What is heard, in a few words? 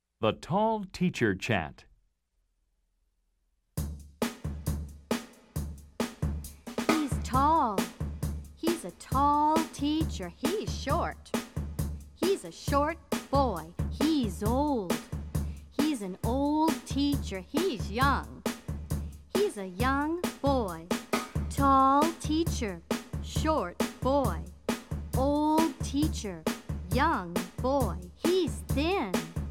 Chant Solo; logos english; Let's Chant, Let's Sing 1